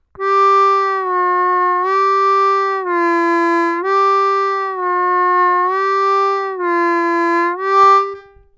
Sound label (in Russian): 2 -2’-2 -2’' -2 -2’-2 плавно, губной гармошки; наработать точность и контроль над промежуточными бендами